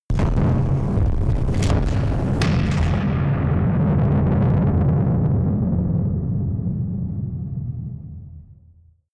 distorted explosions

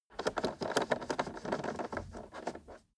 car temperature knob turn long slow

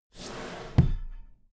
drawer cabinet equipment close regular halfway

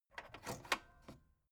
tape deck open

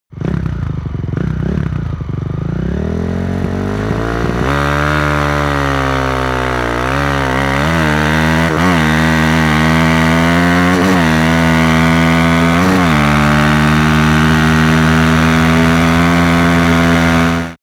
motorcycle motorcross rev up acceleration

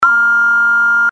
alarm

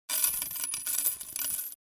money noise

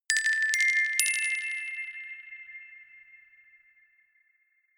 notification glockenspiel rattle triad up minor

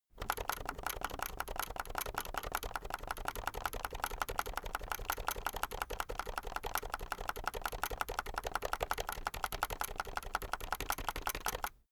keyboard external backspace sequence fast soft

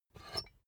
puncher squeak push down

